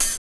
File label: OHH; X-Static Goldmine 1